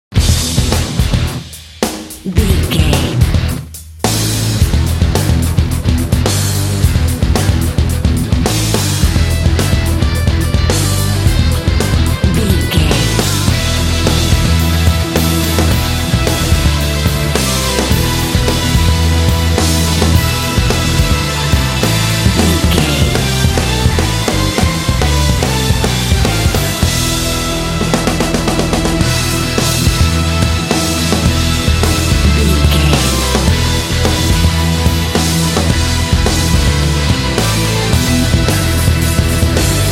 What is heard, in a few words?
ultimate rock epic